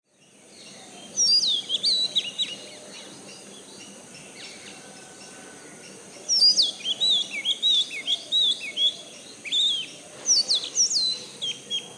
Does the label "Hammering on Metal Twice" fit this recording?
No